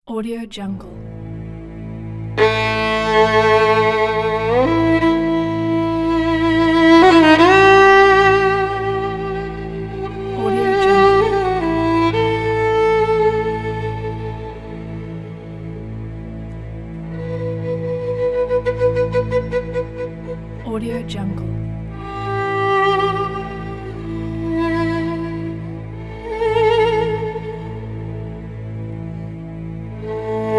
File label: mysterious violin soul